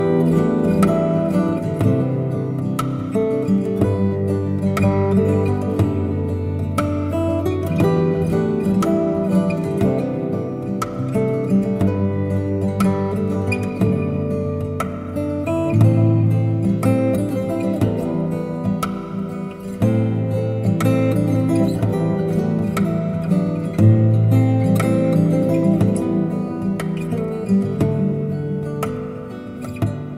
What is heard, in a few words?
romantic love guitar ringtone; nhac chuong